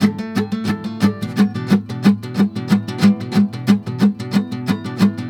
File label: GUITAR, Balkanish Traditions